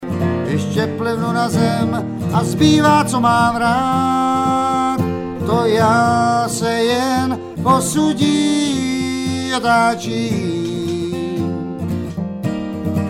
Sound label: vocLead, bluegrass